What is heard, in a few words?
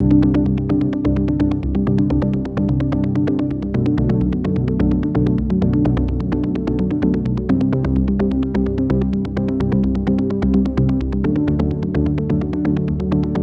Low Pads Plucks 128bpm G